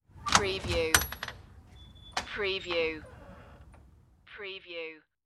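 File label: Open Front Door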